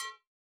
Drumloops Beat 128 BPM Solo Cowbell; VEC5 Multi Loops

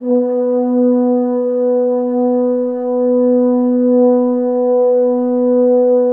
French Horns

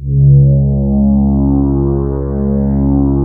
MOOG PAD 1 C2; USB Soundscan vol.49 - Analog Fantasies; AKAI

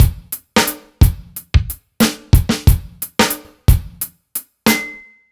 Dry Funk Kit, Drums Beats

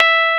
Keyboards Old School, E4; USB Soundscan vol.09; AKAI

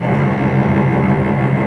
CELLI M TRLL; OMI - Universe of Sounds

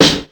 Slang Snare, Ensoniq ASR-X Pro

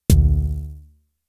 Tom, Toms; Fricke Schlagzwerg; Drum Machines

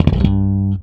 Slap One Shot G, Bass; Bass X

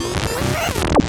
LFO, Noise

